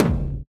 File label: Tom Powered, Drums